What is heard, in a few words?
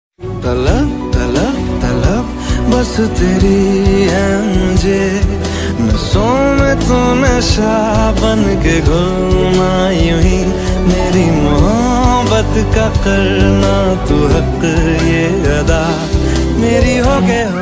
hindi ringtone